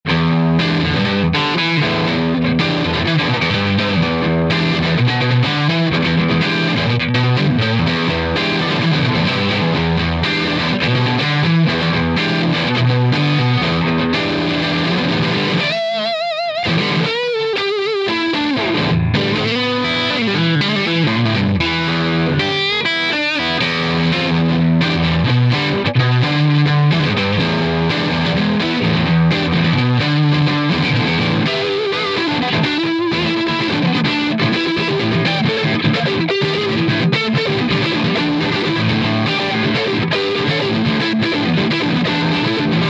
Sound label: Plexiglas 100W with 4x12 Green 25W and Cond 414